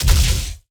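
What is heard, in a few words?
Sci weapon Barrier Blast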